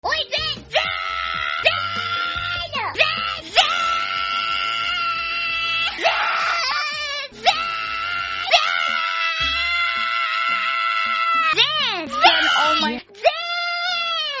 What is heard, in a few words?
screaming; henya